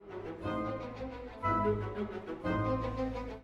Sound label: CELLO; Concerto en la mineur de Schumann; Reine Elisabeth